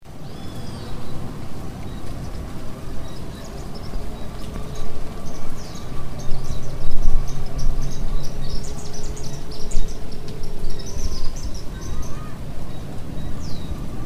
Chaetura meridionalis Andorinhão do temporal

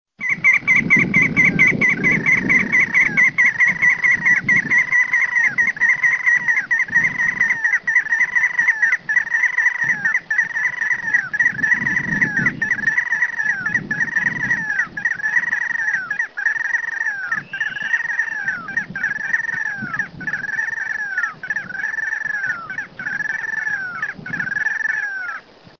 Mustelirallus albicollis Sanã carijó

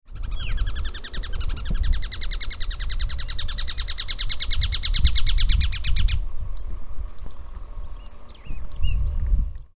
Phacellodomus rufrifrons João de pau

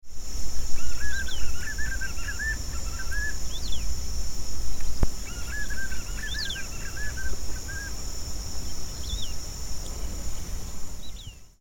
Nystalus chacuru João bobo

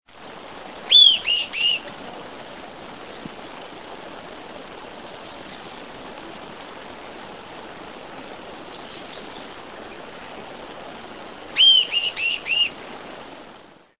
Tolmomyias poliocephalus Bico chato de cabeça cinza

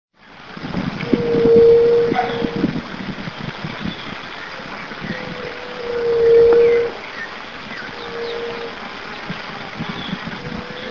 Leptotila rufaxilla Juriti gemedeira

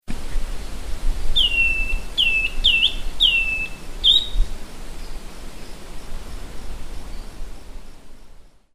Schiffornis virescens Flautim

